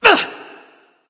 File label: grunt attack; smite; terra